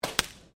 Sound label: GUN TOY DART GLASS HIT; Objects